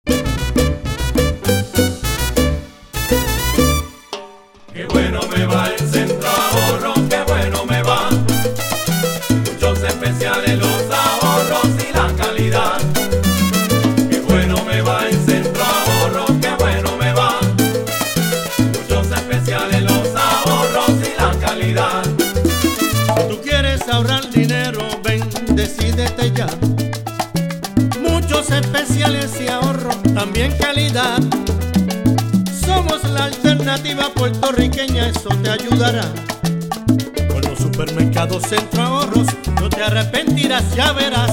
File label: Jingle